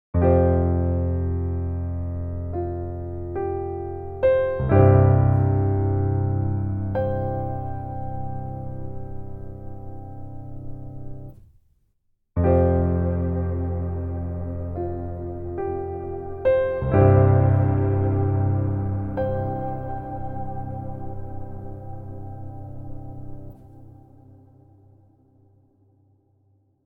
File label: PIANO Soft Piano Pad